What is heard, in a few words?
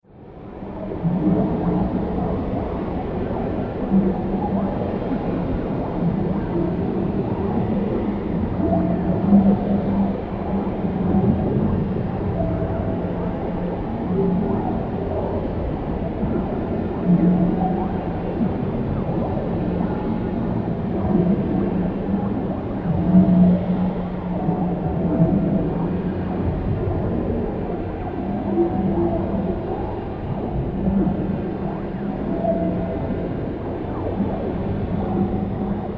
Soundscape Alien Mud Baths, science fiction sound effects